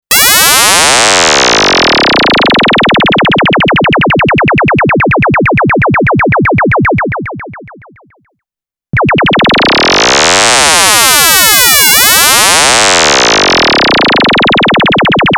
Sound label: svf osc